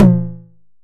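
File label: 909 high tom; Goldbaby Tape808 & Tape909; The Tape 909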